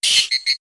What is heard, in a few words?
monster